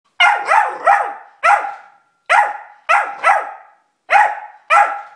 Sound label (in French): Le chiot aboie